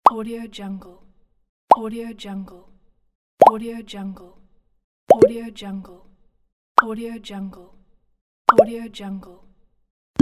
pop ups

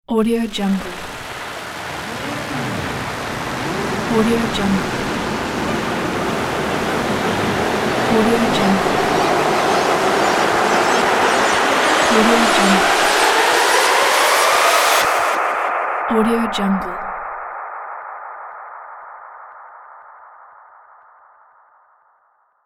flying saucer

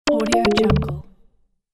arp chime notification

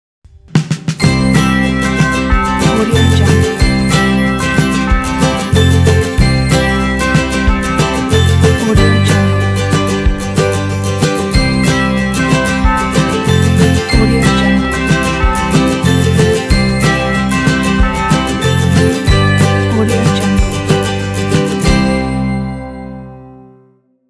uke xmas ident

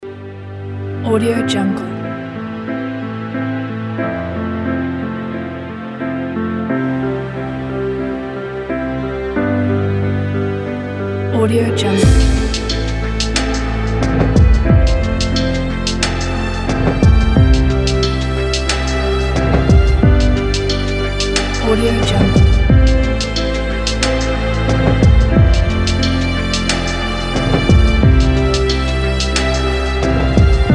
epic story

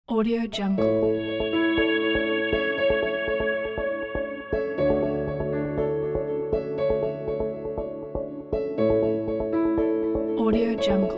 corporate technological pack

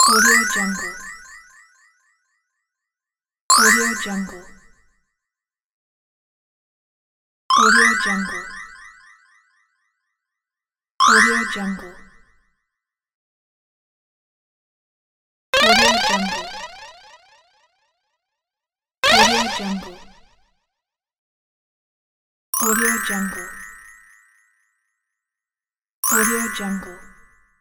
magic spell

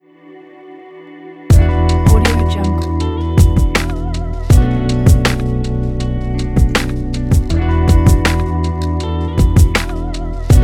lofi hiphop logo

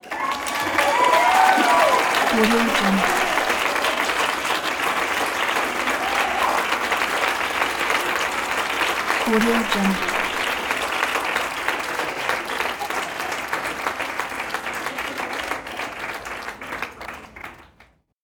applause with cheers

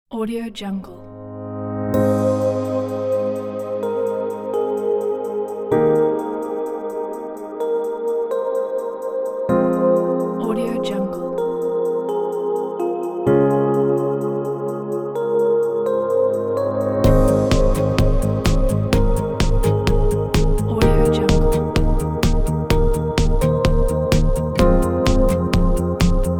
fun ambient

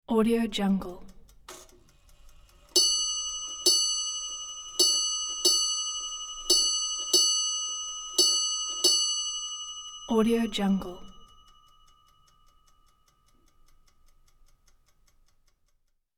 ships clock ringing; sound ideas

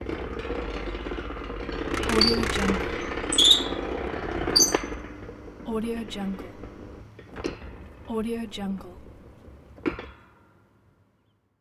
automatic metal gate; lokohighman

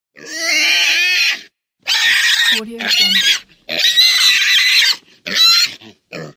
pig

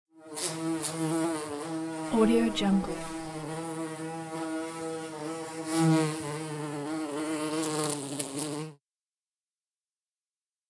bee buzz

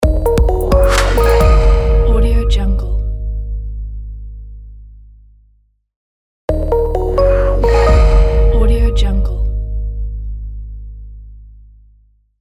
quick logo

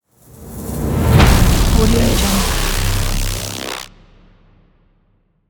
electric distortion logo impact

